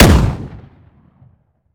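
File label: romeo870 fire player, weapons; gmod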